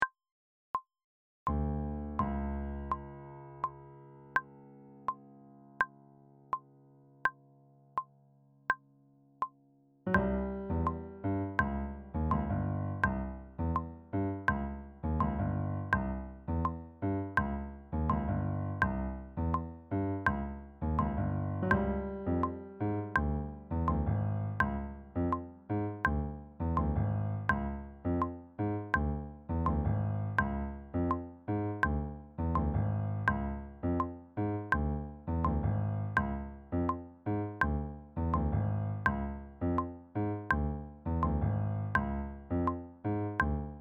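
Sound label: cuban 80 tempo horn and tuba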